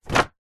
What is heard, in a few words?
hospital xray sheet set down on surface